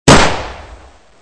smg; Gun Mayhem

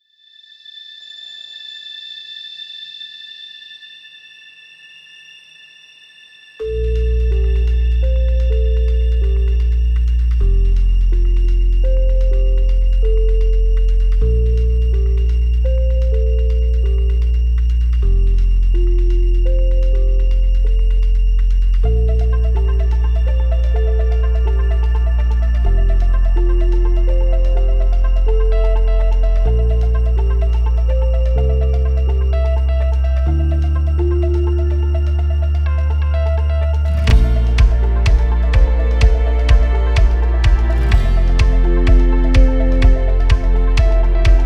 BGM; Tokyo 24-ku Inoru

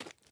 ice step; teardown ps5 windows xbox series xs gamerip 2022

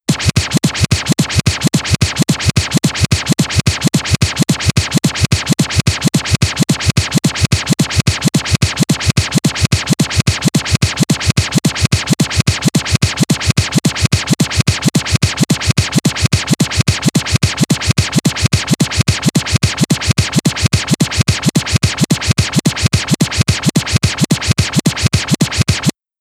News (Disc Scratch); Inkopolis

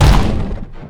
Bomb Explode Sound; Jolly Battle

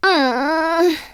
Emote Mistake; Twitch Skijump

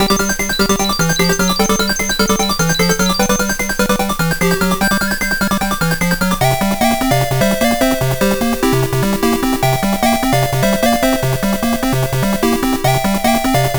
Title Screen (8-Bit Soundtrack); Sonic the Hedgehog 2 Anniversary Edition; Genesis

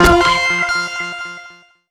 New Leader; Funklift

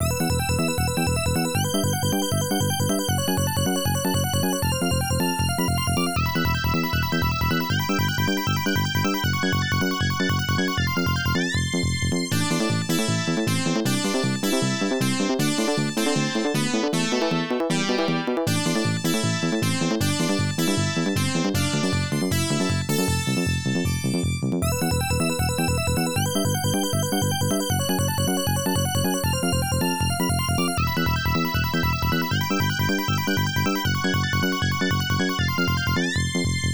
Unused BGM; Salamander; arcade gamerip 1986

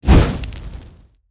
Skill Ground Burnedearth; Angry Birds Epic

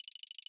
terminal image; teardown; ps5 windows xbox series xs

